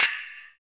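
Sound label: PER PROCU, Procussion, percussion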